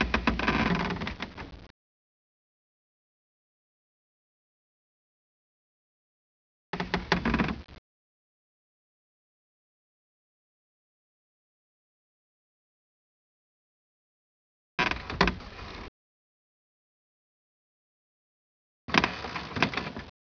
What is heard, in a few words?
creaky wood